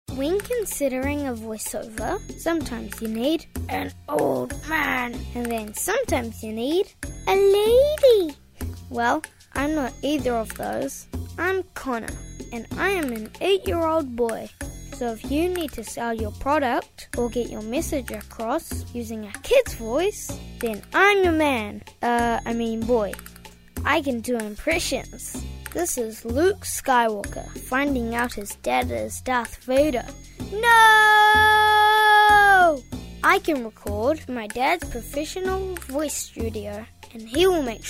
Music

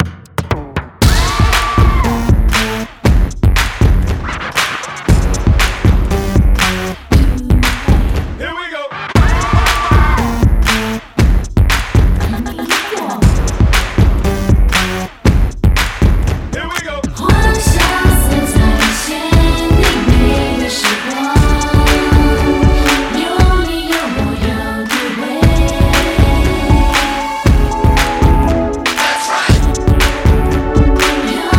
SONG Full Vocals

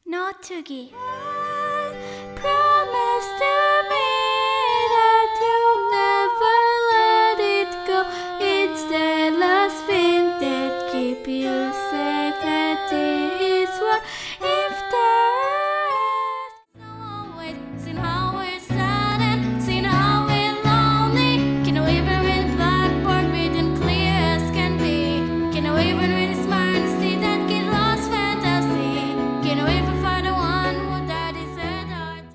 Singing

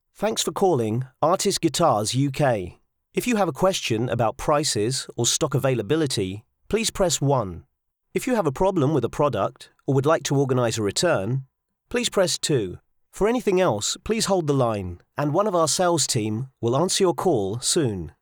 guitar

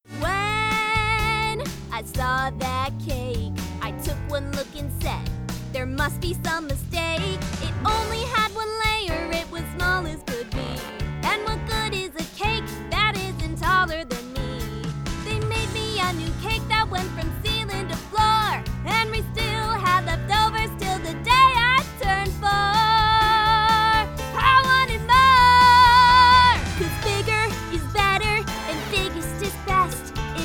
Singing for Animation Sample

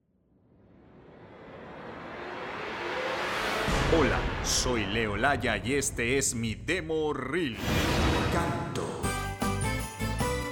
Singers

